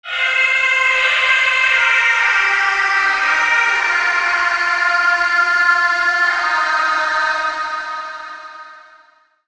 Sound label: Lead